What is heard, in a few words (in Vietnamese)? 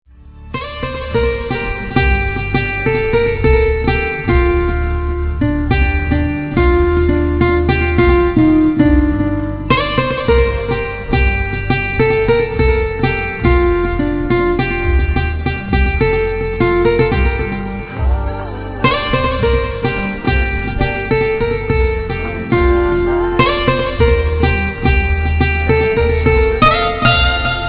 am tieng guitar